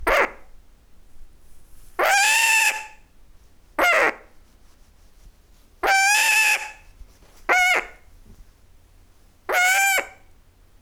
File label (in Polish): przerazona, sowy